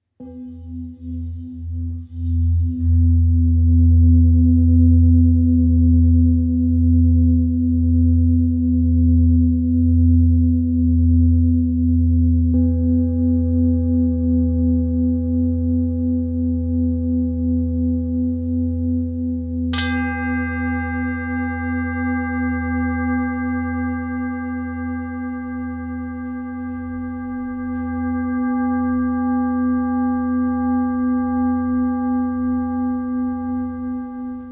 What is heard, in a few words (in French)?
Bol bengale mi